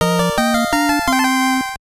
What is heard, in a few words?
Stage Clear; Street Fighter 2010 Final Fight; NES